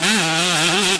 high, Engine

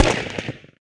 pop; TotalImmersionRacing; XBOX

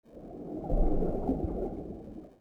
bubbles, underwater; Soldier Of Fortune II Double Helix; XBOX; ambience

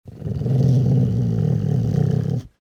patrol, dog; enemy; Soldier Of Fortune II Double Helix; XBOX

